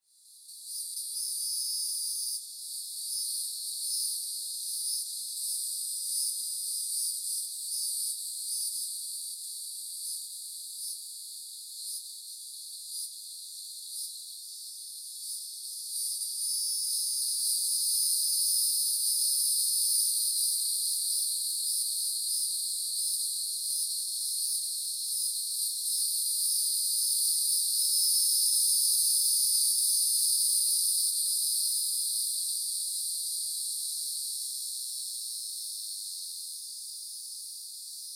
Upland Hardwoods Green Mountain Nature Forest Cicadas Isolated, CENTRAL FLORIDA; FOREST AMBIENCE; Sound Spark LLC